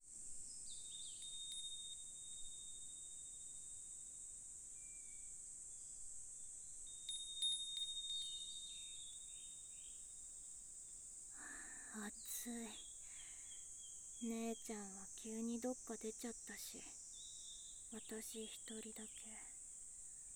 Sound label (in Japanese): 安眠・耳かき